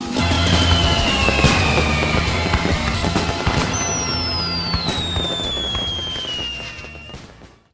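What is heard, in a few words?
fireworks; KevinBlast; marble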